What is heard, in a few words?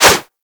Whoosh, Weapons; Warframe; Furax